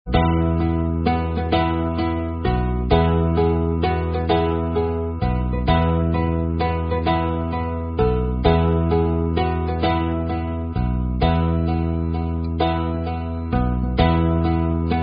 Campfire's Burning, Karaoke Songs; Girl Scouts

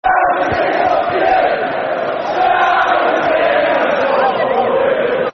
Shall We Sing A Song For You, Football Chants; Reading; Reading Rfc

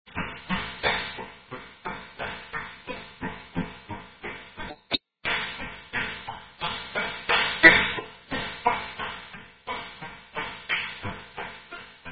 Drinking Game; Three Man; 3 Man Drinking Game

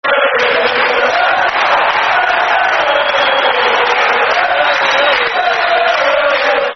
Football Chants (Soccer Songs); Portsmouth Pfc

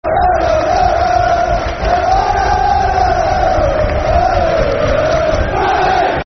Der, Der Palace, Football Chants (Soccer Songs); Crystal Palace Cpfc

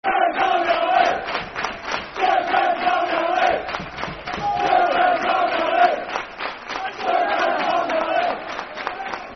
Football Chant (Soccer Song); Stuart Grays Barmy Army; Northampton Town Ntfc

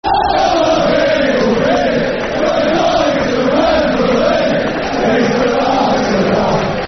Football Chant; Bury; Bury Bfc

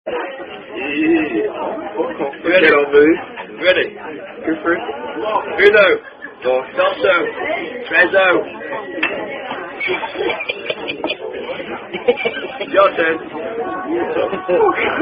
Spoon Fight

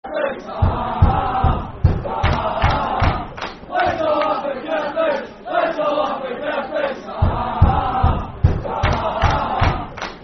Let's All Have A... Football Chant (Soccer Song); Partick Thistle Ptfc

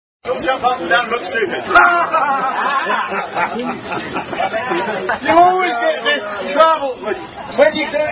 Unknown Hash Song About Farting; The Rasberry Song

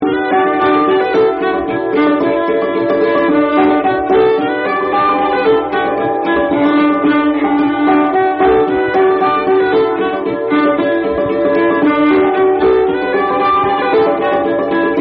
Ring The Banjo

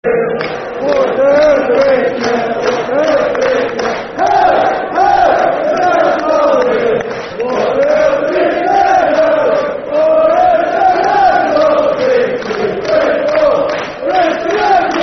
Football Chant (Soccer Songs); Celtic; fanchants-com

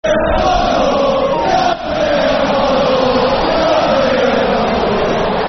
Football Chant (Soccer Songs); Agbonlahor; Aston Villa Avfc

